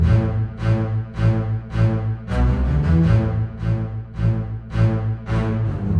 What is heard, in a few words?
Bass up; Сэмплы струнных инструментов для кино; Bass Up Down A; film music; Strings; Movie Score; 80BPM; БАС; Струнные инструменты